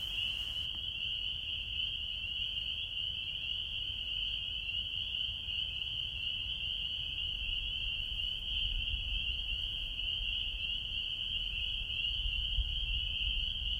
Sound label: Frogs at night